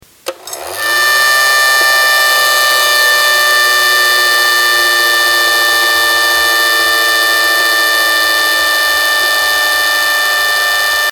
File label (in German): weckton; deutsches uhrenmuseum